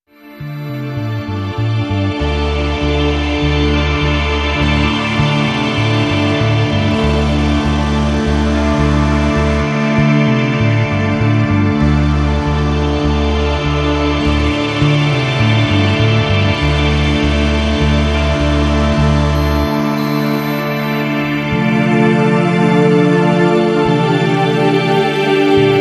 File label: Space Bass